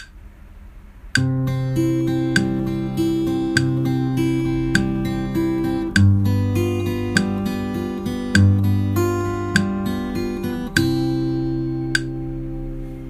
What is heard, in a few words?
arp